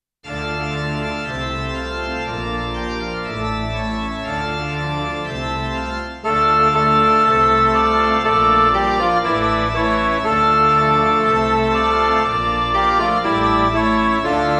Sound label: mass save us savior midi